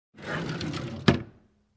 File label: Closing Slide door